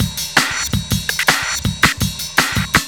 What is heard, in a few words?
Break; Jungle; Breaks; Blu Mar Ten - Jungle Jungle - 1989 to 1999 Samplepack